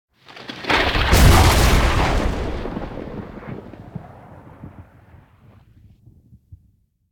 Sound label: Thunder Clap - Heavy Crack; Hollywood Edge; sound designer's toolkit v2